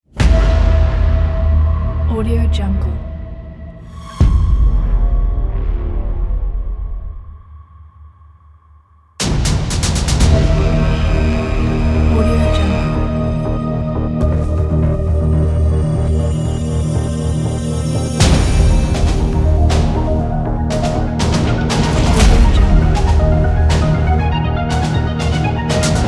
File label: intense hybrid trailer; goodideaproduction